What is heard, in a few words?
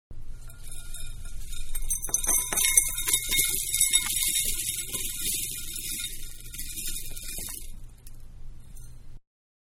Cereal-Pour Into Big Bowl-No Bag 1; Pouring; Manhattan Production Music